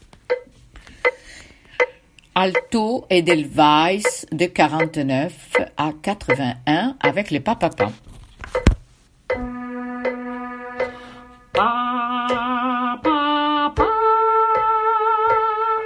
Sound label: alto